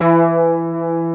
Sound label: VOY8 POLYSYN, VOYETRA 8; Greytsounds VOL-1